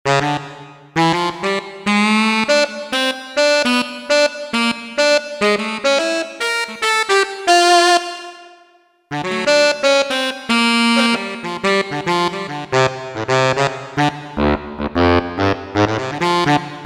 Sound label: Tenor Sax, Roland JV-880; Factory Patches